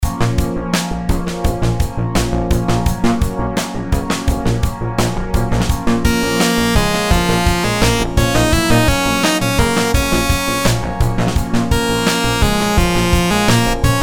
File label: rhythms simple dotted 8s 85